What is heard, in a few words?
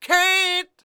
SING W, Vocal Planet Blues & Soul, BLUESY; ILIO